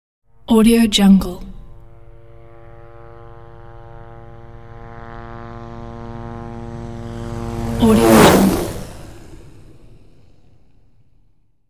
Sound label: helicopter flying by in mid high altitude; industrial sounds; پرواز هلیکوپتر در ارتفاع متوسط